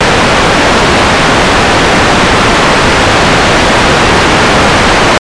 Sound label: Roland SH-101 Noise